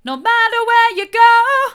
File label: hard, vocal